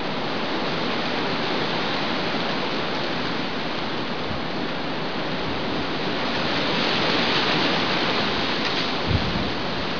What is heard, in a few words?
wind at sea